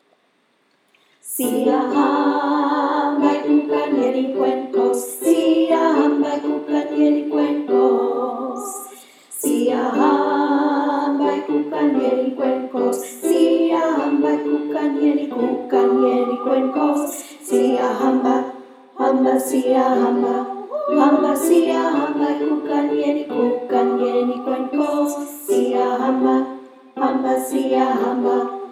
tenor siyahamba

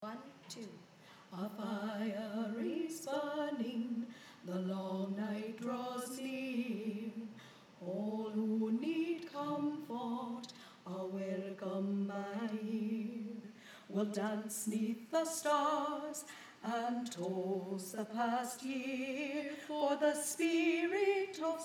solstice carole soprano

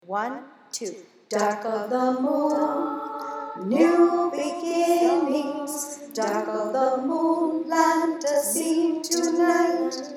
alto; dark of the moon

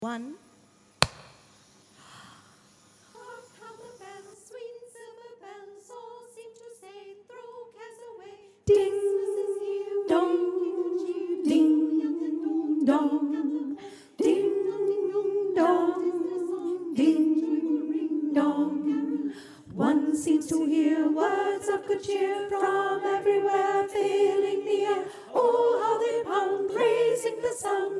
alto; carol of the bells

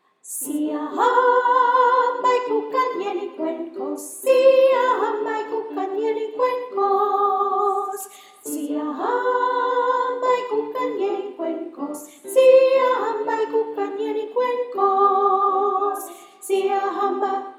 sop siyahamba

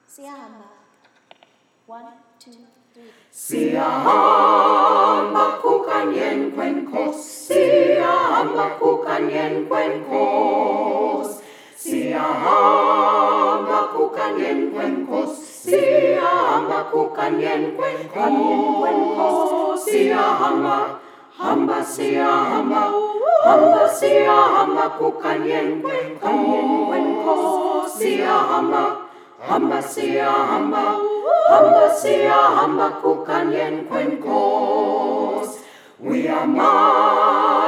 all parts siyahamba

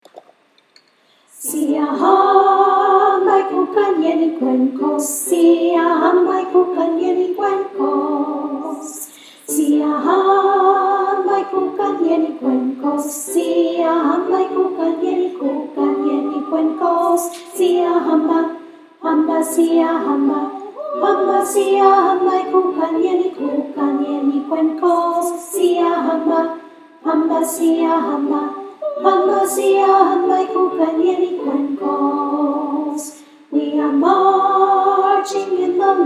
alto siyahamba

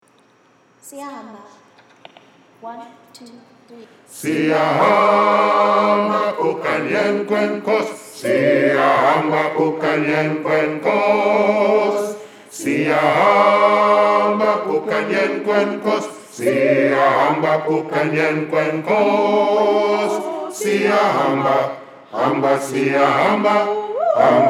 bass siyahamba

